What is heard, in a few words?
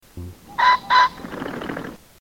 pheasant crowing